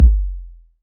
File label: 808 BD long, Roland-808 Drum Hits Bass Drum